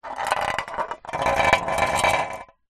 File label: drink can empty drag concrete ground